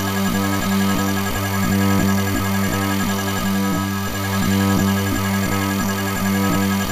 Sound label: ai drone aggro scan loop; Titanfall 2